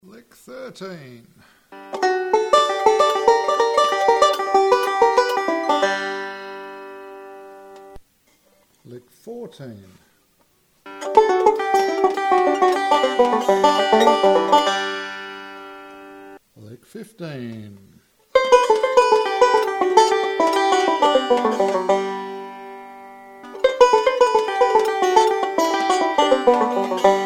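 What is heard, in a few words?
banjo licks, bluegrass banjo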